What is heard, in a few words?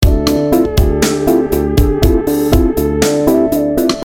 Loop